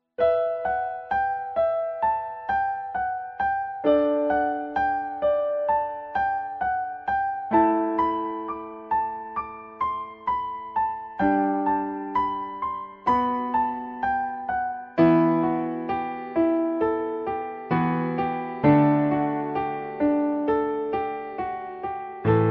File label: sad BGM; chennai express; deepika padukone; shah rukh khan